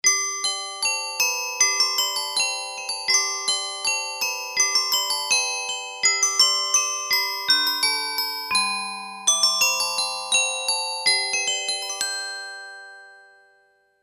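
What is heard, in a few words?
Glockenspiel, Roland SC-880; Factory Tones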